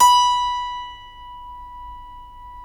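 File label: B4 clavinet right, Keyboards Acoustic; USB Soundscan vol.17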